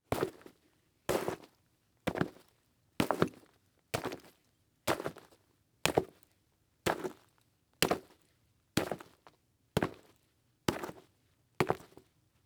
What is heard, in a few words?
Foley Footstep Boots Walking On Rock Salt; Foley Footsteps